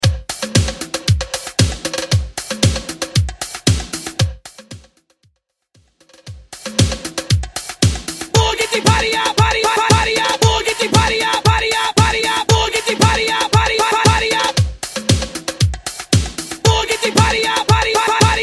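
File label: 80s Dance Hype Intro Extended Re Drum; Mainstream VIP; Vegas Edit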